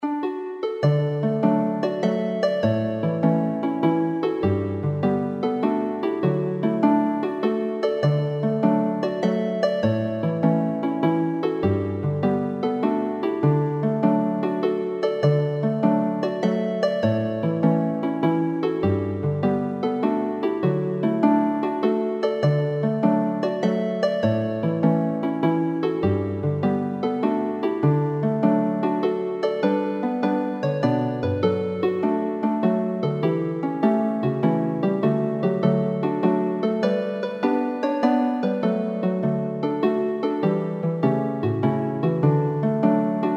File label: Mazurka